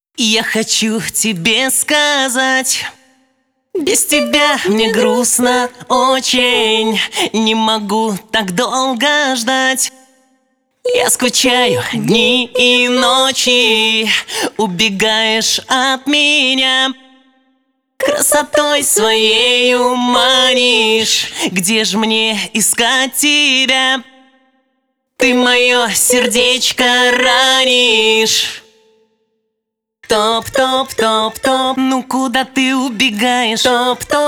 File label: acapella, 135 bpm